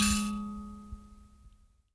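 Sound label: high kalimba G#3; Heart of Africa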